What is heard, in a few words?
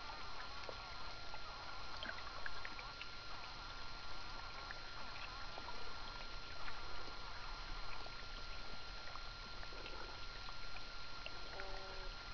whinning, birdlike